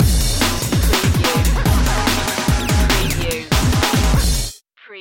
blaster beat sting

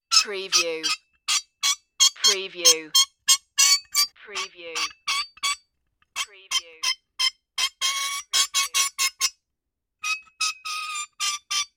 painful metal squeaks

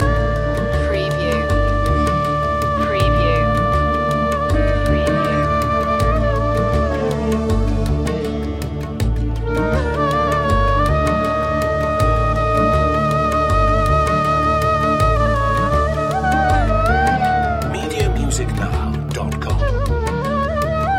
sarod and flute raag bhairav loop

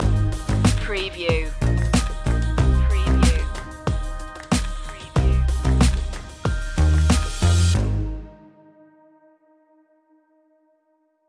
bluestone streets

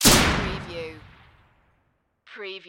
Flare Gun Shot

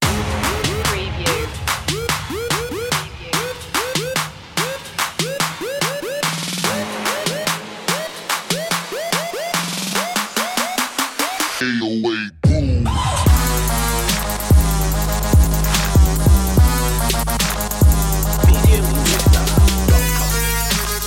808 boom

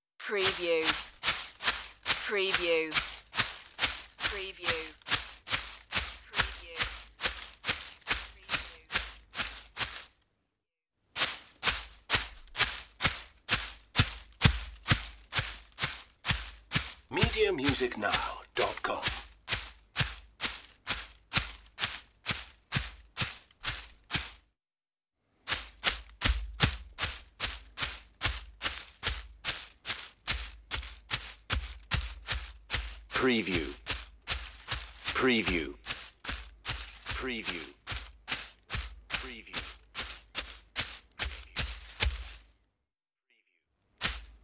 running in the forest

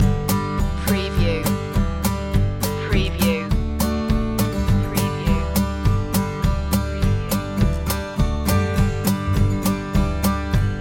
two step loop

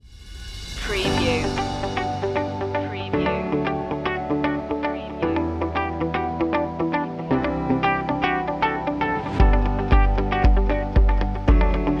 easy corporate

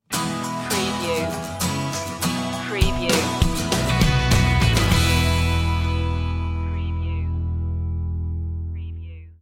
carefree stinger

